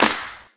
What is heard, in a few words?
short reverb clap single hit A key